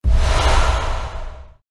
item collected

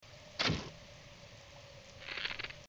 bow shot no enemy; Zelda - Breath of the Wild

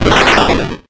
explosion; Duck Game

